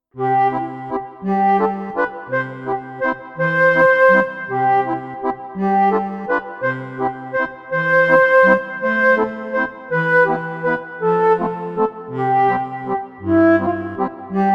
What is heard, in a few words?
Waltz